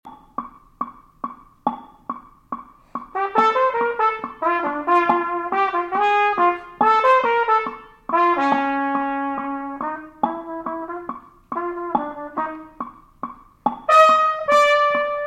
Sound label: jazz trumpet latin